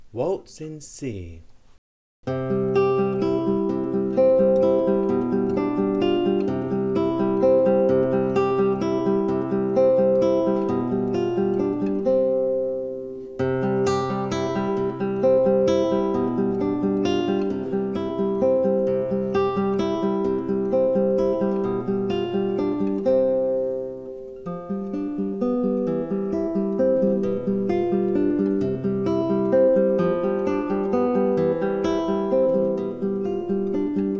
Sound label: Waltz In C